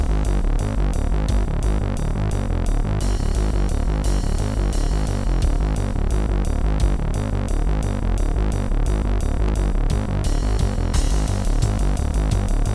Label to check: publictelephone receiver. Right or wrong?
Wrong